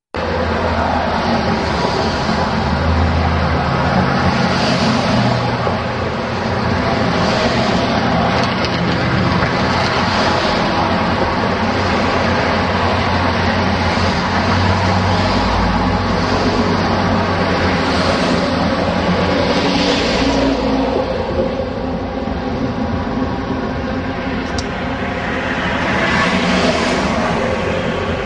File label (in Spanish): el trafico